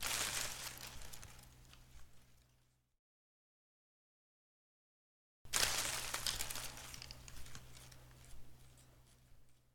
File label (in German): Blatt; © 2010 Jan Frederik Vogt